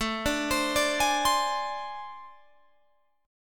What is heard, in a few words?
A Minor Major 7th Double Flat 5th A, Guitar, Open G